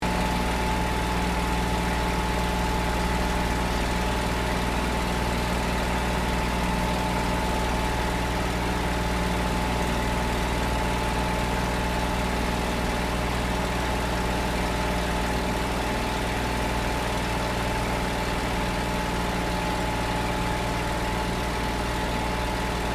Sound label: AIRCRAFT P-40 KITTYHAWK FLYING INTERIOR STEREO; P-40 Kittyhawk / Warhawk / Tomahawk; Airplanes (Sound Effects:Vehicles)